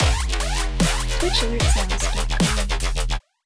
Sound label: Dubstep Raid